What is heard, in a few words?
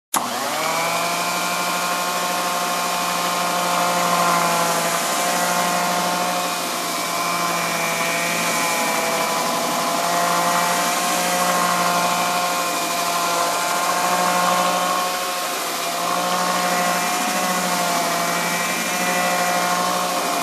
Construction